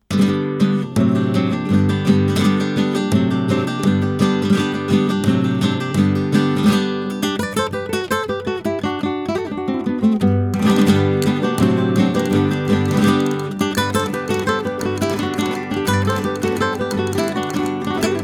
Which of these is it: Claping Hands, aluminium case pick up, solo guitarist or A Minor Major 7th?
solo guitarist